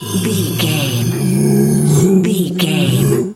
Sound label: bear roar